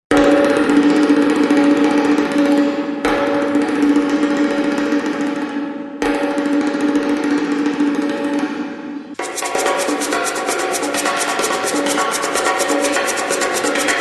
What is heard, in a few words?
Percussion